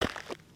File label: Foot Dirt Sneaker Walk, footsteps